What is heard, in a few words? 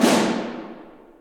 Snare